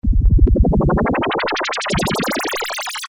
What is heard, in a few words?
Rocket Men, Roland Juno-106; Factory Preset